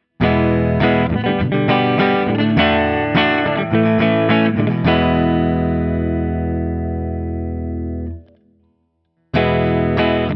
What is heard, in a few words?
Guild 1994 S100; 1994 guild s-100 polara; ODS100